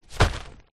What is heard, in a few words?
tent in bag drop wood